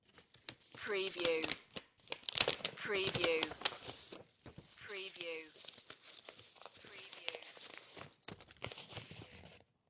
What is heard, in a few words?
envelope open